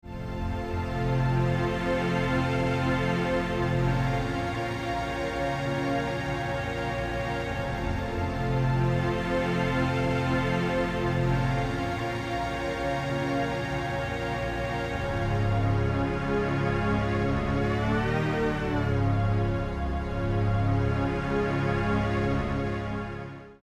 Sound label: strings